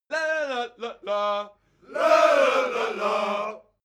crowd musical bawling

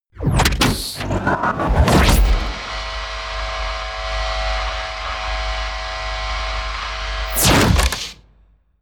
promo pad

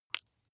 flesh wet greasy squish single

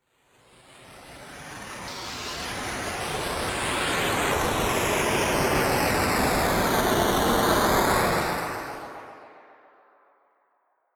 wind wave

